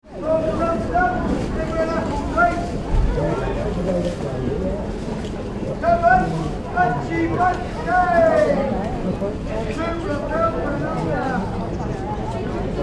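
Town Market Atmosphere - Crowd chatter - voices and activity; Sporadic traders calling - footsteps - shoppers; Crowd Sound Effects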